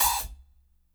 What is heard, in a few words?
hat, micro kit